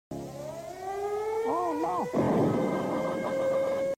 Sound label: dial; chapman